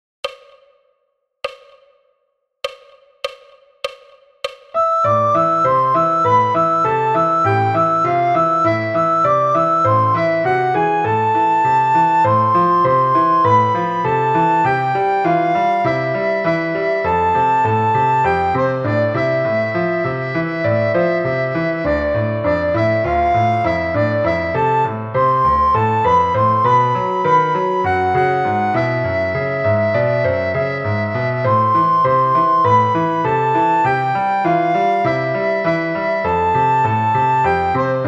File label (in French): rustig